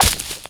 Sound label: dirt small